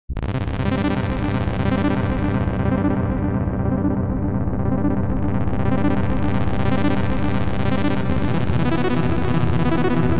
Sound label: seq 303